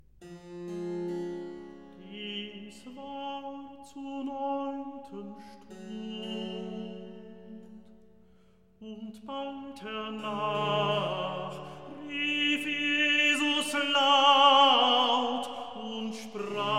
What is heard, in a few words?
Recitativo; evangelist Dies war zur neunten Stund'; Klassika; Handel Masterworks